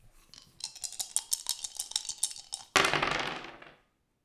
kockavetés, dobókocka; Perkupa

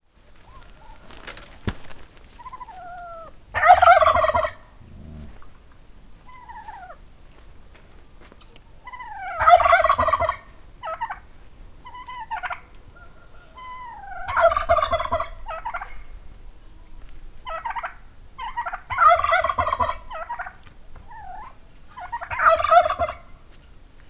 pulyka; parasztudvar; pulykakakas

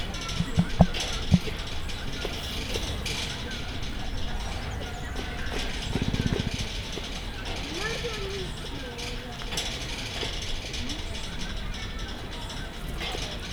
kerítést csőrével csipkedi, emu; felsőlajos zoo 2015; cardioid

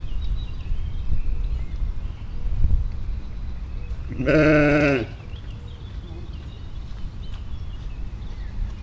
egyszer szél, kameruni juh; allathangok; veszpremizoo 2013

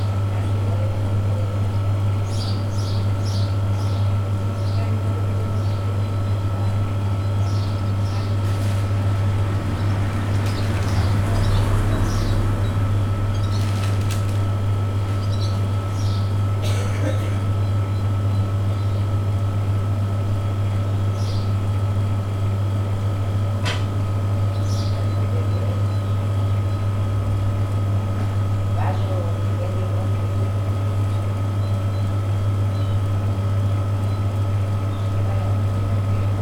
csendélet a zöldségesbolt előtt; Potamia; hűtőláda Thassos

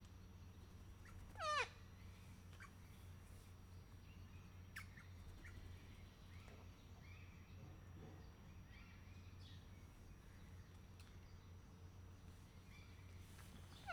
felbátorodva, csupaszszemű kakadu; miskolc zoo

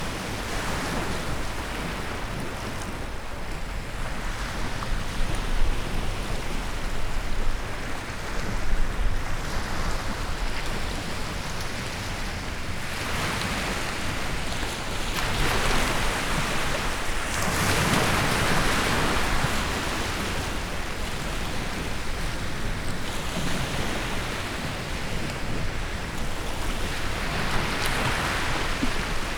partot moso hullamok

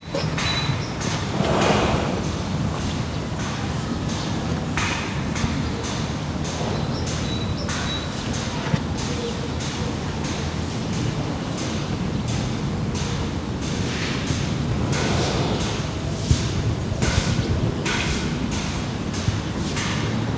többet is szuszog, nílusi víziló; debreceni zoo 2012; marantz puska

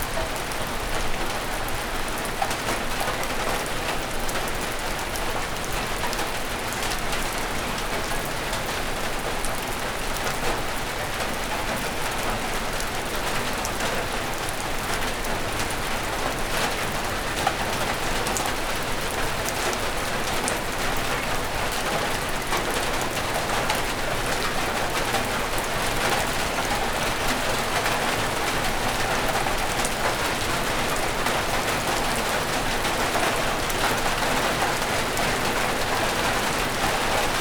kopogó eső

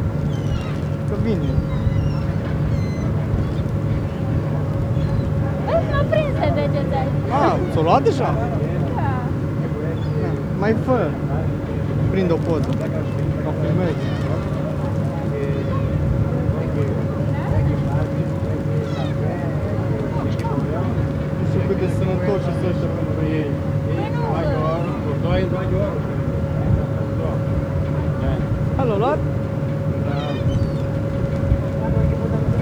a sárgalábú sirály utasok beszélgetése a kompon; allathangok; termeszetben; marantz

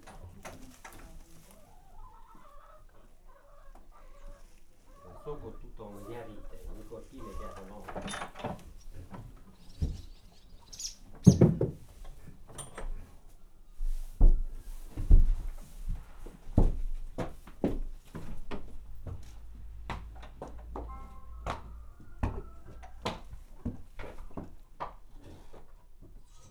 nyerit fecske, lo; állathangok; paraszt udvar

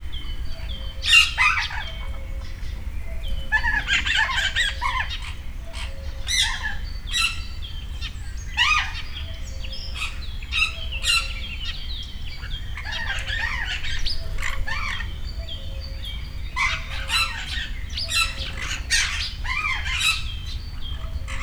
kakukk is, sziklapapagáj; állathangok; miskolci zoo 2009

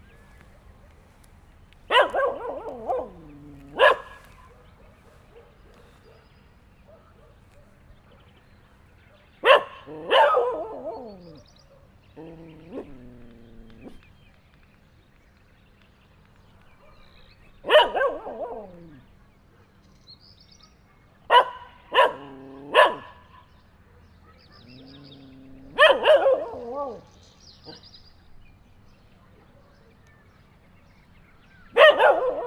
ugato kutya balrol egy bicajos; hortobagy; kerekpar varosi

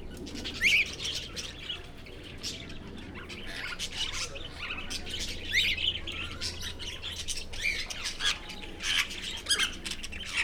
csivitelnek, papagájok; Győri Zoo 2014

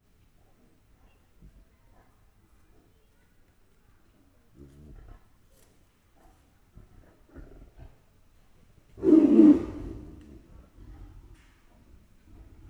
kifutó, áuuu, tigrisház nyitása, bengáli tigris; miskolci zoo 2009

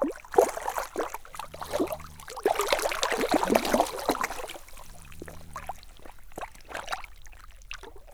halcsobogás halk horkolás, ponty; termeszetben; recsk

